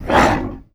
attack; Gish demo

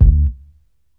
Bass Deep D1; One Shots; Instrument

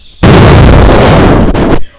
medium fire; AngelTearsv3; AndromedaSky

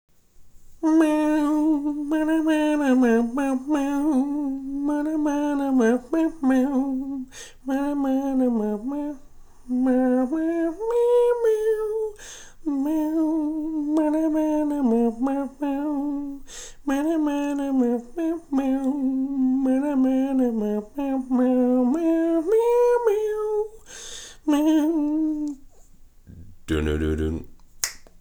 Lofi Loop

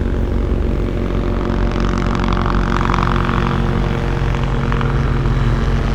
Noise Helicopter; Ableton Live 12 Lite; Vector; Sprites

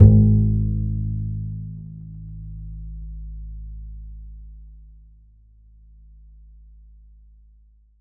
Bass A1

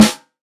Snare, drums; One Shots; Bluesbreak 2

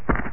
walk grass; graal2001

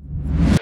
FX Reverse; FX Hit; Drums; Ableton Live 12 Lite; Core Library

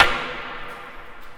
Snare, drums; One Shots; Mkit

